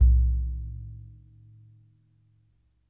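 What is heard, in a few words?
Large Frame Drum, World Percussion One Shots; Sony CS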